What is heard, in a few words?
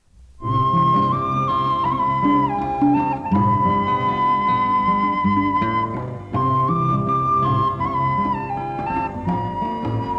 instrumental; mundari words of life